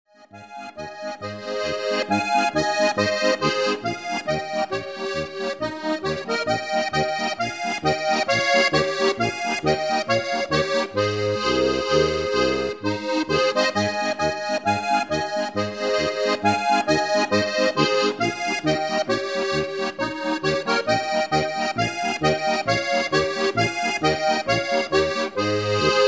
Autofahrer Polka

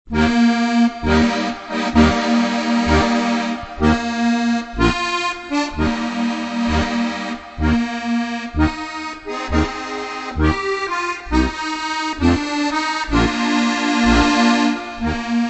Brautchor

